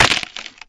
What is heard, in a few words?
neck snap; scp-173